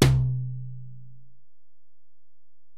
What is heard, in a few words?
Tom, Alesis DM-PRO, Drum Machines, Toms